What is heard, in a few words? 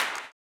CLAP, Blues Soul; Spectrasonics Vocal Planet